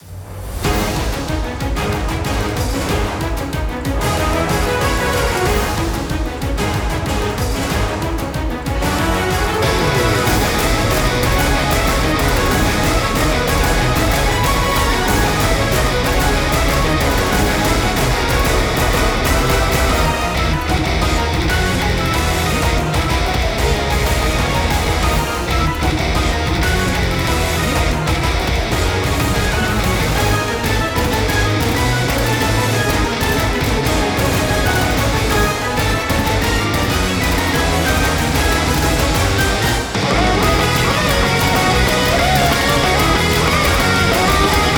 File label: bgm boss